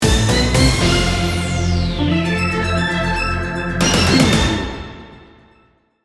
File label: reggaeton heat sting bumper